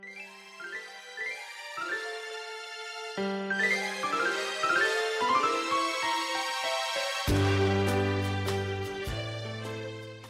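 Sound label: round dance Instrumental Waltz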